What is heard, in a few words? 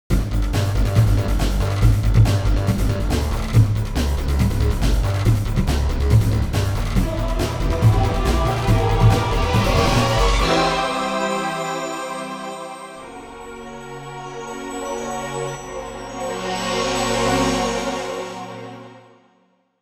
Endlevel